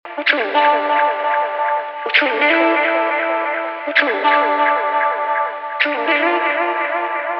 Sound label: 130 Echo Vocal Loop D min